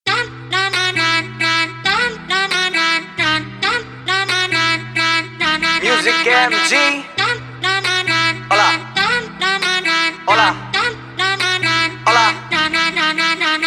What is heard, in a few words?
Shanti instrumental bgm